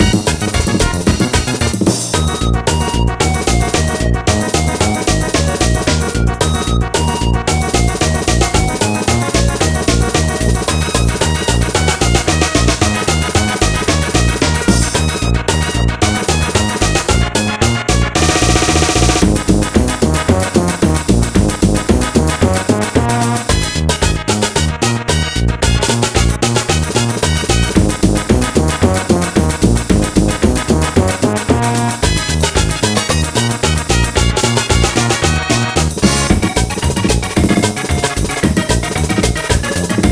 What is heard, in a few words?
drum bass normal